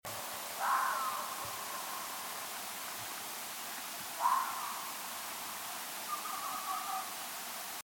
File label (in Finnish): kettu; mke300